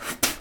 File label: spitting; greed shooting